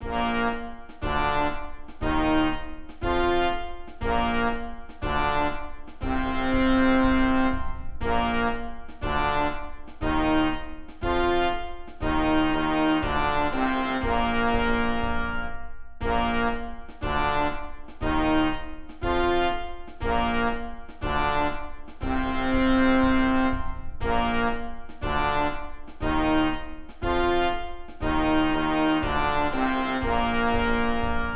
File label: Swing it perc